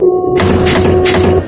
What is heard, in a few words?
headcrab canister open, canals ambient; source engine; hl2; video games